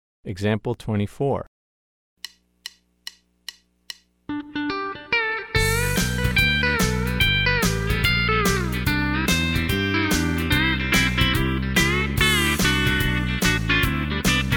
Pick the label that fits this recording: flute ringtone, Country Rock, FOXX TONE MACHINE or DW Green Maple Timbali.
Country Rock